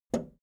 ui button video tape player plastic rewind release